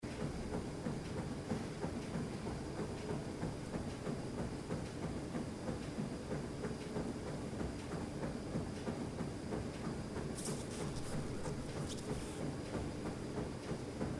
Cardio Room

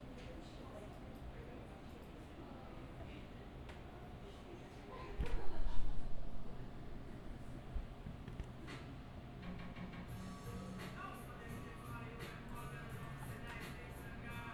soundscape cafe; harveymudd

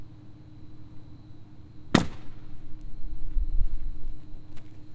reverb amphitheatre; pitzer

